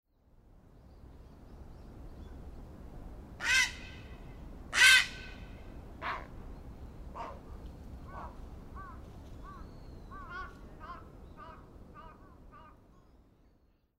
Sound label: Grey Heron L to R